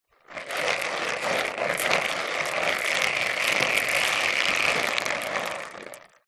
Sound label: leisure toy plastic bingo balls spin swirl in plastic tub; zapsplat